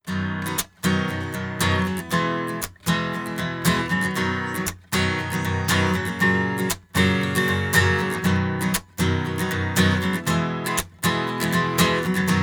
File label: Oktava mk319